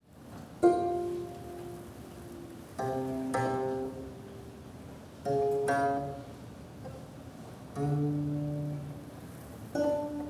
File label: gayageum poems